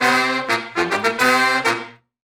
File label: Horn Sect Effect; Sonic Foundry (Sony Creative Software); Jazz Solos and Sections; Effected